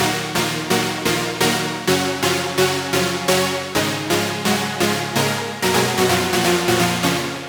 Super Saw Loop, 128 BPM D# min; House; Synths

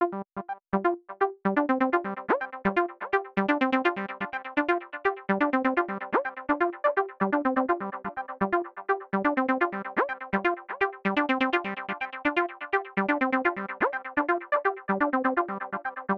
125 BPM G Min Acid Bass, Sunset; Cymatics; GHOST (Beta Pack); Acid Dream

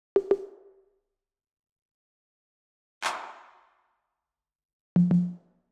Drum Loop - 100 BPM Percussion, RnB; Loop Stems; Cymatics; GHOST (Beta Pack); Atrophy Drum Loop

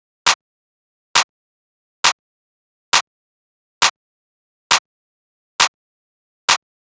Clap Loop 135, Techno; Drums; Ghosthack; Techno Sounds 2025

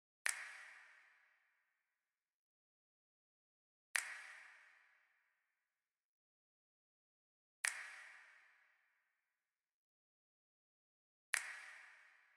Drum Loop 130 BPM Snap; Loop Stems; Cymatics; Vibes II Drum Loop Collection; Genie Drum Loop

